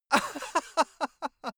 male laugh bright

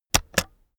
keyboard external space press slow heavy

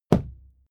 fight impact sweetener punch low

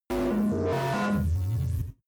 space computing machinery hard

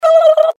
blblbl

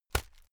hit plastic fleshy

